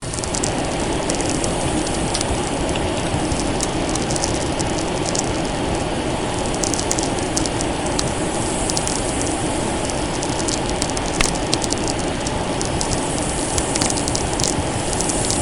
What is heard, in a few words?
Solar Minimum Interfearance